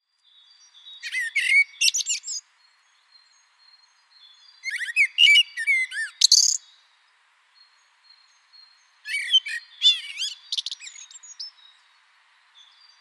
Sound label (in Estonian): musträstas; spring; linnud